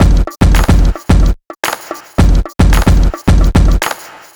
Clap; Clap That

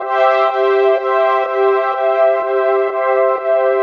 Duck Pad; сэмплы пэдов; диско хаус

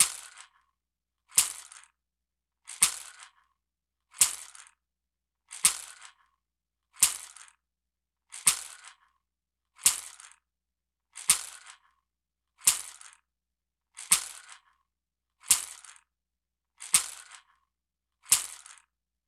Bean Shaker 85 BPM

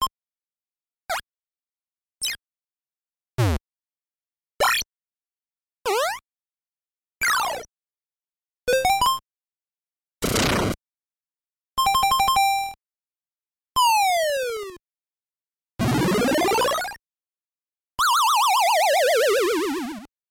sound effects; звуки и звуковые эффекты из игры; donkey kong jr math

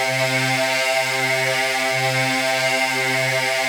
String Resonance, евродэнс

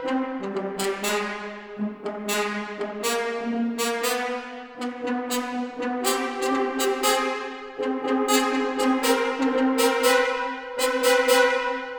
Staccato Horns

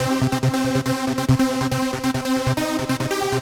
Big Lead Low, техно транс

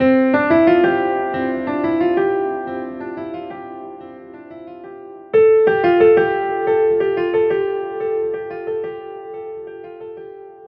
Delayed Piano

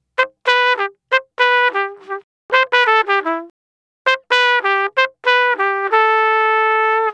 Trump Solo; brass

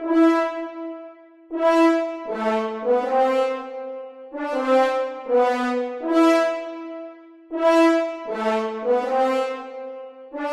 Majestic Brass; звук для фильмов и кино

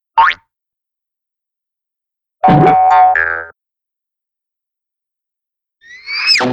Zippel Comic A, dance

